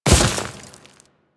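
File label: Step Vegetation, Footsteps; Enemies; Warframe; Grineer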